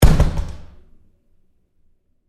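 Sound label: Door Bathroom Stall Close Close Up Hard; Household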